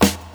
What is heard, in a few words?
smooth snare g key